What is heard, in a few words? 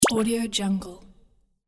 cyber bubble; rageguitarstudio